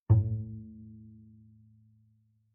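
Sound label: Bass pizzicato fortissimo sul A A2, Double Bass; MIS Pitches - 2014; Strings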